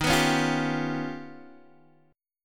Eb Augmented 9th Eb down Guitar DADGAD

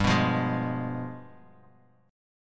G 7th Flat 9th G down, Bass Standard; strummed; JGuitar

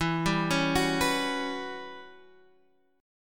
E Minor 6th Add 9th E down Guitar Standard; Em6add9 arpeggiated; JGuitar

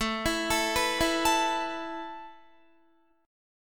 A Suspended 2nd A Guitar; Asus2; arpeggiated

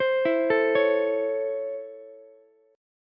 C sharp Minor Major 7th Sharp 5th C sharp Ukulele; C#mM7#5 arpeggiated; JGuitar

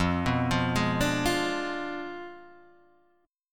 F Diminished 7th F down Guitar Standard; Fdim7 arpeggiated; JGuitar

